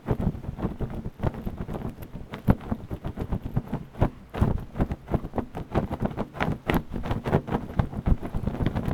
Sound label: Flag Flicking On Strong Wind Isolated